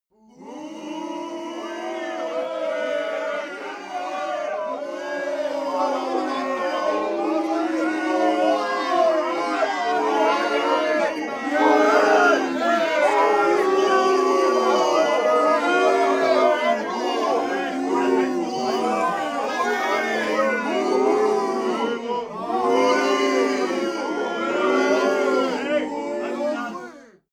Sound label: male crowd booing